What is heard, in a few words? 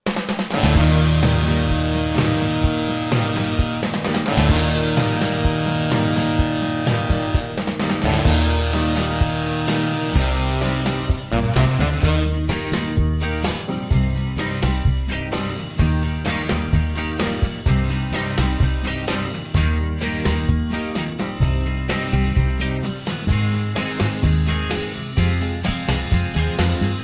Instrumental